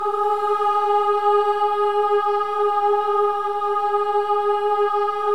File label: BOYS AHS, Symphony of Voices